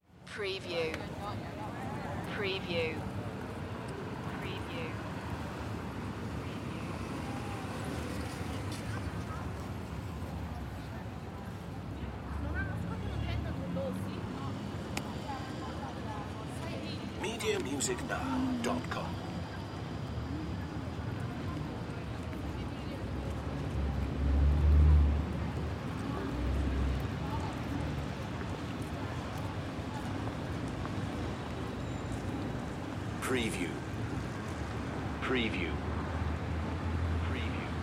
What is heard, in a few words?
Italy Street Heavy; Sound Effects Bible